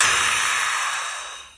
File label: breath crash; Crashes